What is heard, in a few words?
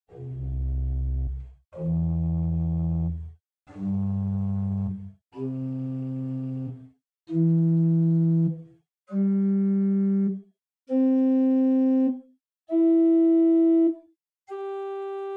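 Pedal Spitzprinzipal 8 arp, organ; Buzard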